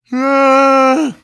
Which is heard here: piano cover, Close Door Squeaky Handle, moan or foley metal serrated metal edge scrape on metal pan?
moan